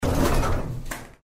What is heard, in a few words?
lift Elevator short